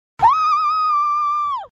wwoooooooo

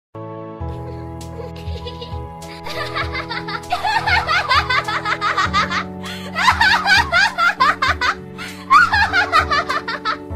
yandere laugh; ayano

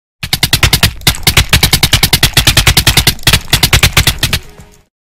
multi hit

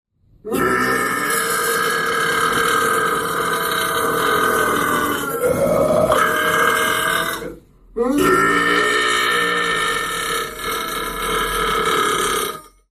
burp

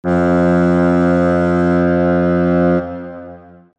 alarm boat horn

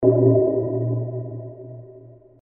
gong; goldeneye

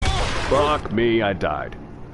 laughing

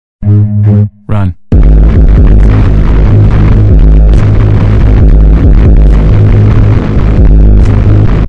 bass boost

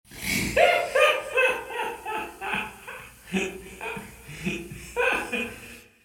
laughing

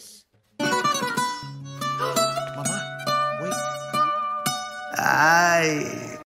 guitar riff

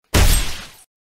strong slash

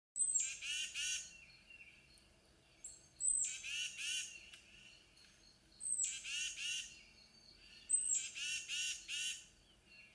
titmouse call

